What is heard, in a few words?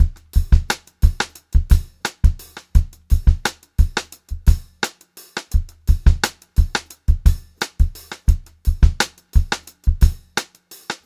Funk-Groove Drumloops, 87 STRVAR; USB Soundscan vol.38; AKAI